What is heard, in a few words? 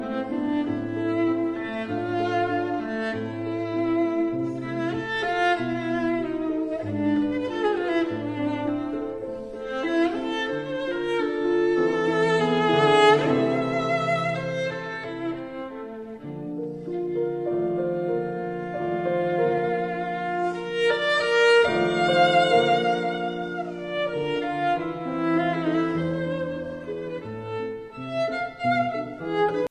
arpeggione sonata